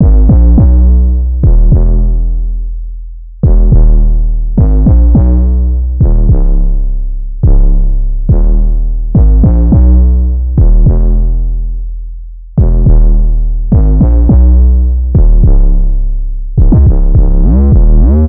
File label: Synth loop 105 G#m, Synth Bass Loops; Slate Digital BASSHOLE Sample Pack